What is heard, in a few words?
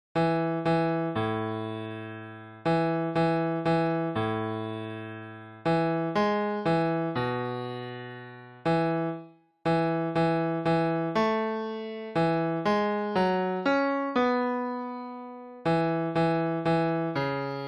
Bass